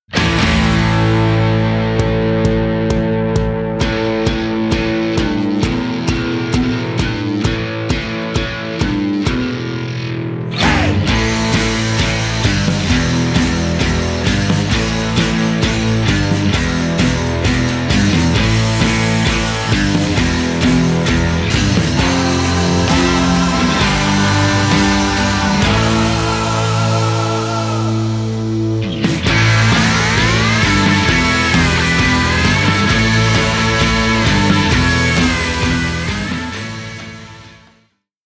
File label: Rock